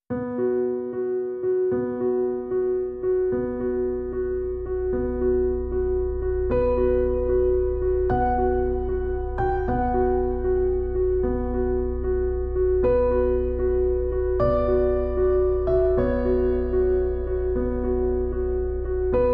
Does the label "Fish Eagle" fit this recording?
No